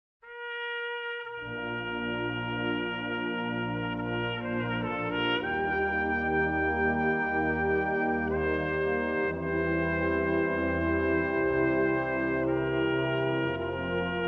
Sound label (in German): Hoerprobe Militaermusik; Saxolution